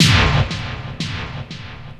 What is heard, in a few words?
Electronic Perc